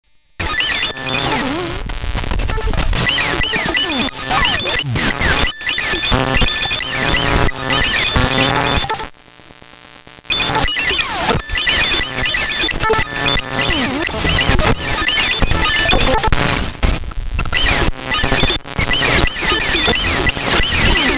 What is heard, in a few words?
casio ct 380; circuitbending; Gijs Gieskes